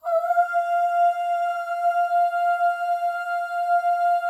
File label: FEM OHS, Symphony of Voices; Spectrasonics